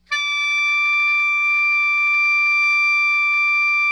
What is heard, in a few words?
SOP MF D 5, Saxophones; Greytsounds; Philip Wolfe David Crozier's Saxophones